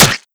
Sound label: Hunting Knife Hit Torso; weapons